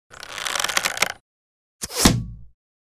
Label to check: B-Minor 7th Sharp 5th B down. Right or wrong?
Wrong